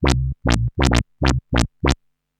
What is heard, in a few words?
Arp D Line, ARP Odyssey; Lines; synth; musicradar-synth-samples